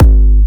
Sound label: Big Kick